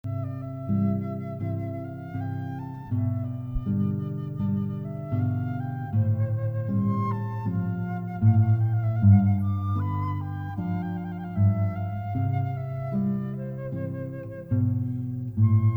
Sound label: Guitar and Flute